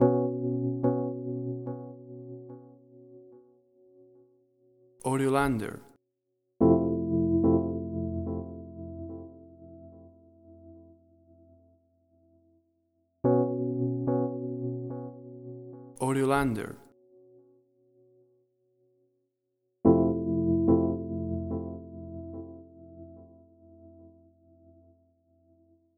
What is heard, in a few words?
Atmospheric Melodic Ambience; AUDIOLANDER